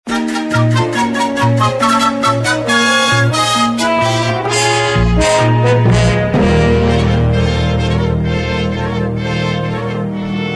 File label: jingles; LBC